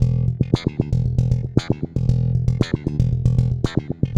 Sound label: WAH FUNK, ARPEGGIOS; ILIO; Trance Fusion